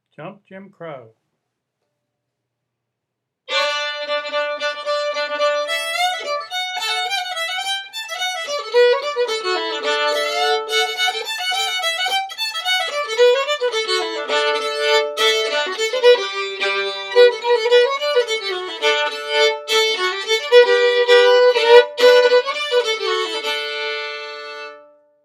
fiddle; festival tunes

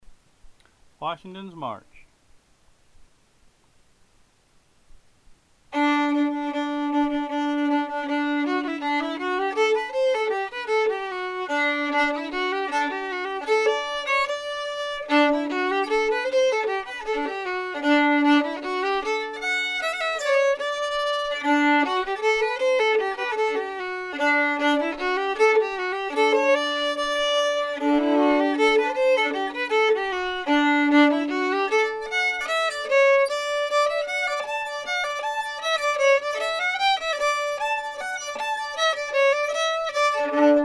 March, fiddle; festival tunes

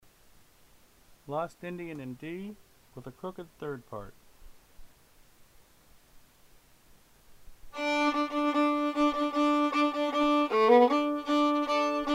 in D, crooked, fiddle; festival tunes

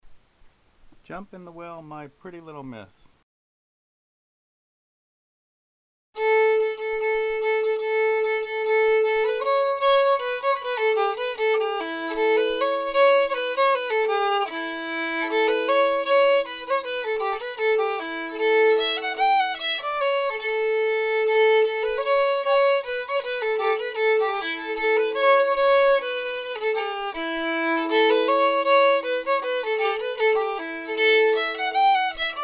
fiddle; festival tunes

